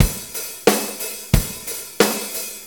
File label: 90 ROCK, DRUMS